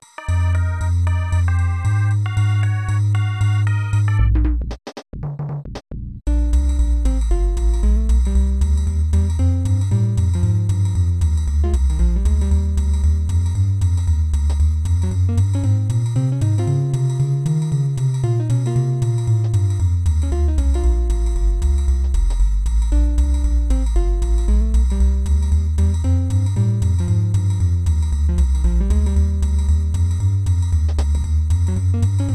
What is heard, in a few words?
Jazz (Aircheck); animal crossing gc rip